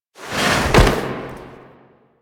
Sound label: Grab Foley; Cinematics; Tenno; Warframe; The New War; Throne Room